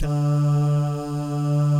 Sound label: DAH, jazz FX, mix guy gal